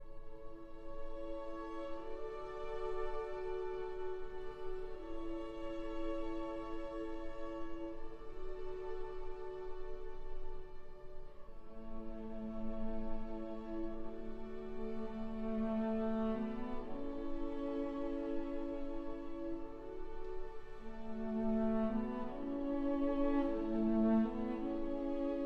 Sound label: for cello and strings